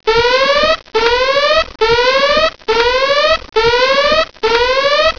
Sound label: Alarm